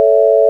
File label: sine; ものづくり基礎工学（情報工学分野）; 音の波形と分析